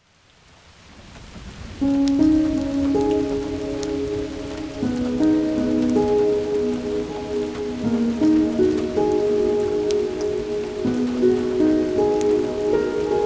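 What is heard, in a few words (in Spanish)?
Música para Dormir Rápido - Piano Con Lluvia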